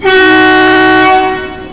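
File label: truck horn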